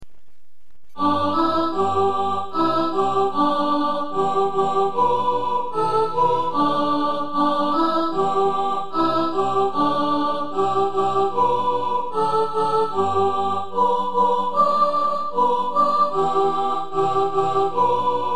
soprano, choir

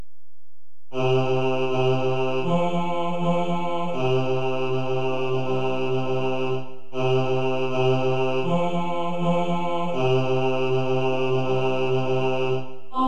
bass

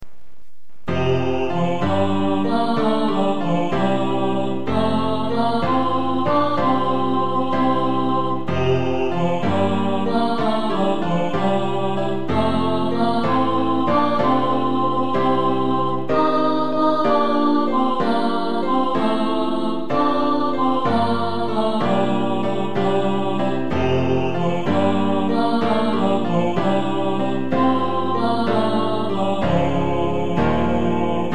spring men, choir

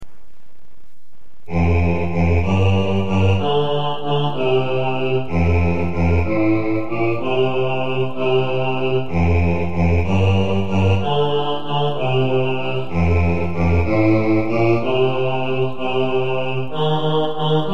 4-part bass, choir

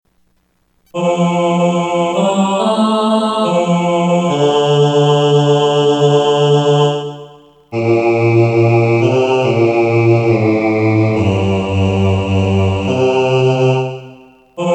Bass, choir

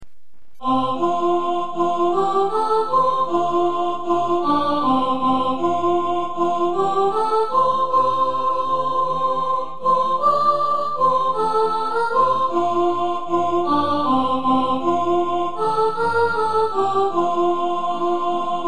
soprano, choir

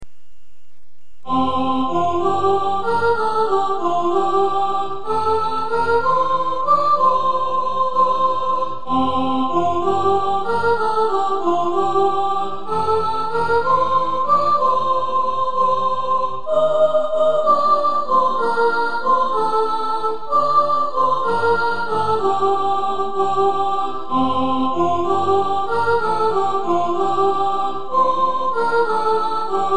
4-part soprano, choir

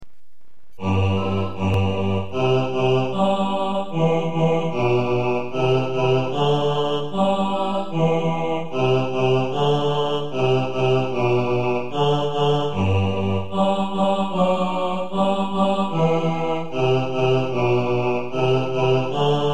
bass, choir

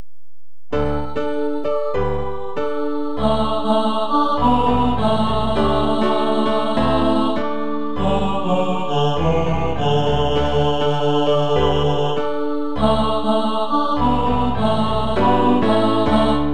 tenor, choir

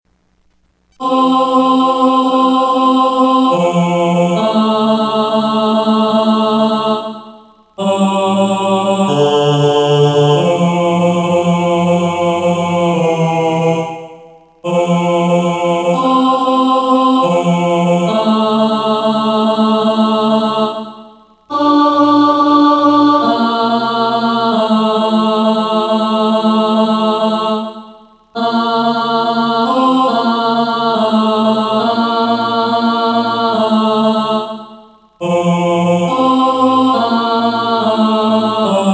Tenor, choir

